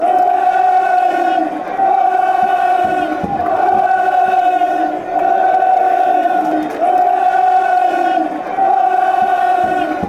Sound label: betis, fan chants